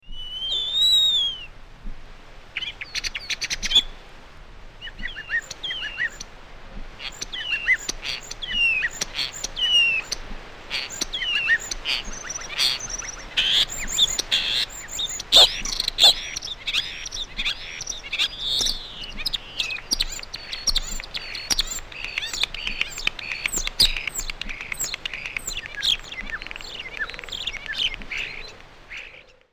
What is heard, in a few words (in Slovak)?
skovranok, zvuky vtakov